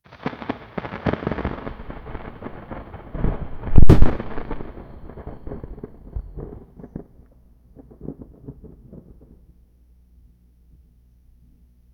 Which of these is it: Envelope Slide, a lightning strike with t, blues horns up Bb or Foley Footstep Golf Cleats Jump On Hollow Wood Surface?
a lightning strike with t